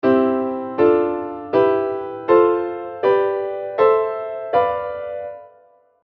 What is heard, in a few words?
diatonic